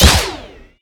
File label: crit hit